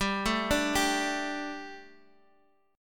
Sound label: A 7th Suspended 4th G